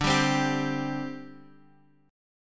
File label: C Suspended 2nd D